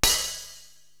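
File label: HH Big Chunk Open, hi hat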